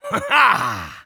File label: vce relief fall